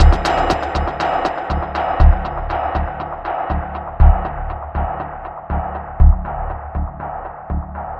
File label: designed loop experimental tension 120 bpm with bass; sonniss gdc